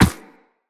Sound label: Run Ice, Footsteps; Characters; Operator